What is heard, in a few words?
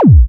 Club Kick